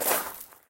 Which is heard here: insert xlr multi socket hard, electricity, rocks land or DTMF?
rocks land